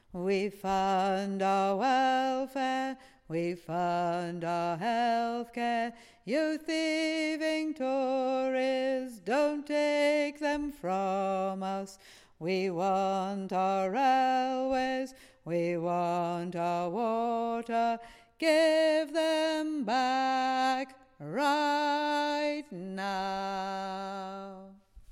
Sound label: Bass